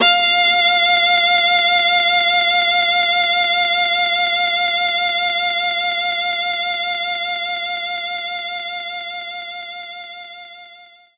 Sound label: Viola F5; knights try